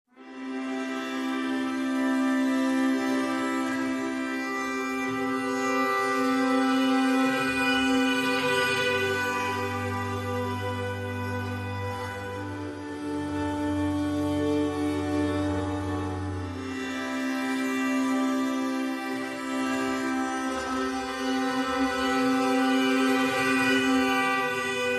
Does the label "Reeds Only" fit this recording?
Yes